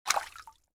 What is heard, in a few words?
Aquatic frog jump and water splash